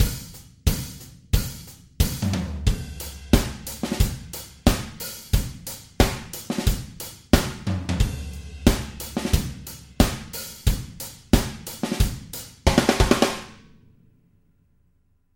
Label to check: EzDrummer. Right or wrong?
Right